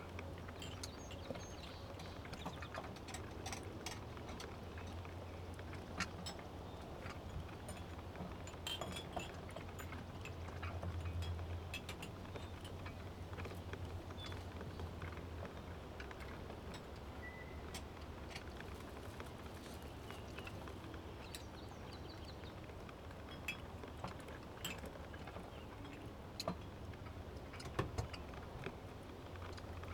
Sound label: indoor abandoned cafe meal; LOOP; anemoi